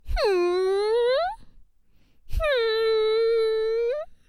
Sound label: hmmmmm hmmmmmmmmm